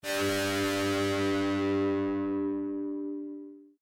fire long chord; Adventure Time - Elemental; ThrillGames

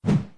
swish, basketball; Nickelodeon Basketball Stars 3; ThrillGames

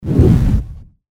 fireball; Keep Out! A Dungeon Adventure for the Brave

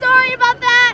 idle, Truck; pranger sticker

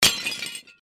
breakpot; Keep Out! A Dungeon Adventure for the Brave

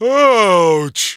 lose; patrick sticker

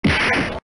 chute; Ben 10 - Alien Rivals; ThrillGames

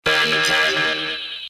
noise; Ben 10 - Tomb Of Doom

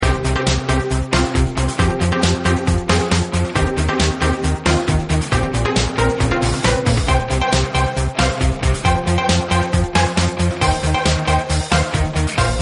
music; Power Rangers vs Teenage Mutant Ninja Turtles - Ultimate Hero Clash 2; park

